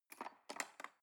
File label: cutlery cake fork drawer scrape